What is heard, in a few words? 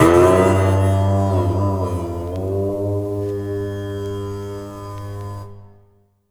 Sitar Hit; Sonic Foundry (Sony Creative Software); Electronic Point Blank; Drums & Percussion